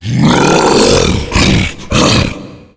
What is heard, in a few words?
tank climb, growl